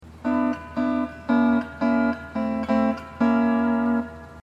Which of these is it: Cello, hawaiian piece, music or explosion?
hawaiian piece